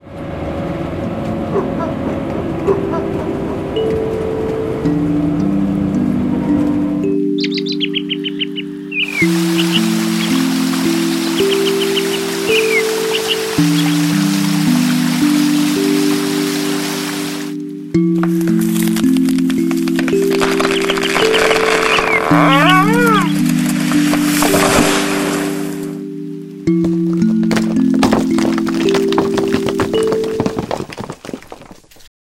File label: kalimba